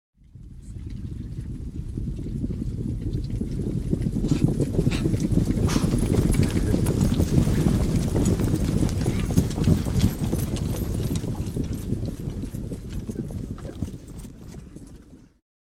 Infantry Charge Feet Only; Historical; Hollywood Edge Historical Series; War Horses Armies & Weapons

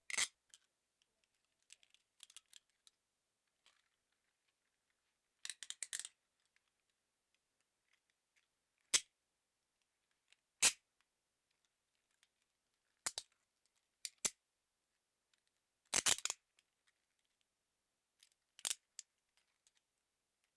Handcuffs Ratchet Metal Rattle Constant; Foley - Props; Foley Essentials

